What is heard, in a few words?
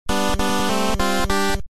Gameboy 8 bit, Ringtone